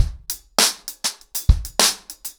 100BPM, ACOUSTIC DRUMS

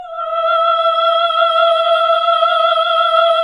HAL AAH, Nuns Aahhh

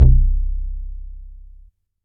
DM BAS, Dance Mega Synth

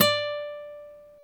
GTR Nylon, Nylon Martin

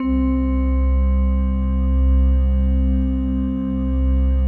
PAD, DIGI-PADS

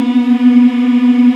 VOX AFMM, VOX Afro Mms, Africa; Roland LCDP11 Africa VOL-1

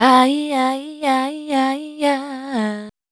VOC F, Dance Vocals, FEMALE

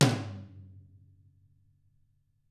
TM HIT, Drums

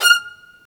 STR, Roland String Master Series, Vls Marc

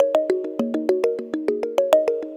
Tongue Mallet Echo; Melodic; Ambient-Melodics-II

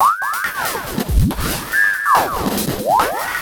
E Kit, Drums & Percussion, Electronica; Sonic Foundry (Sony Creative Software) - Electronic Point Blank

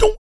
VOC PERC, Vocal Planet Jazz FX BASS PERC

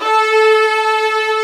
VIOLAS E3; AKAI S-Series CD-ROM Sound Library VOL-2

